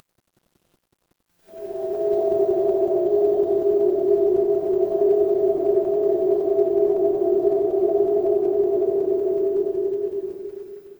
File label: ambience forest